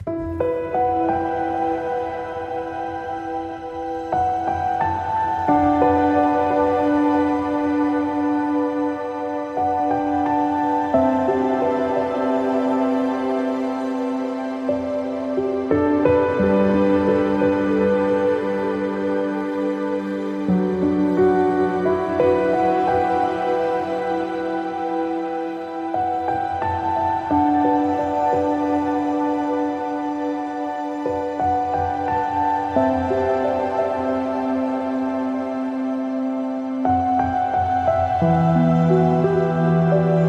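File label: Mirissa, ambient piano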